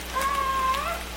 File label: Biggs awww call; humpbacks inland WA BC